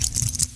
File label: elite deploy, weapons; cstrike